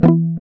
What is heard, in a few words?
E2, Acoustic; Sound & Vision; Gigapack I CD 2; GUI ACOUST.; GUI Acoustic 1